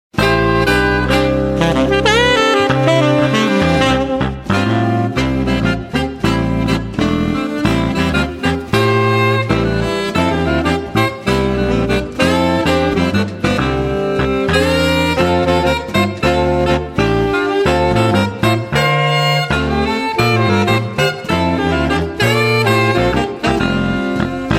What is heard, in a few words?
tuba